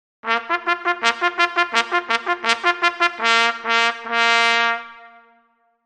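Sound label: signals, bugle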